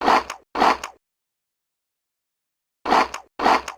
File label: devour; dgs-tobias